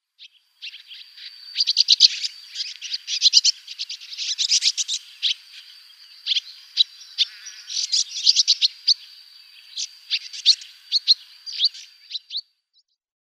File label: Ethiopian Swallow calls